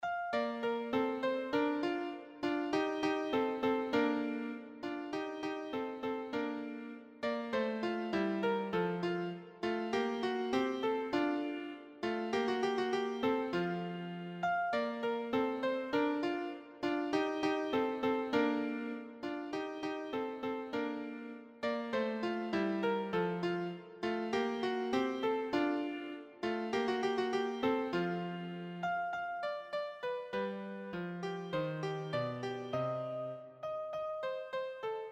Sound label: Allego in B Major